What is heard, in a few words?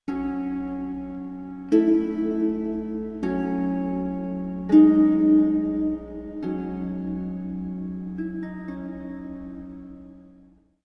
harp single; Dark Fall The Journal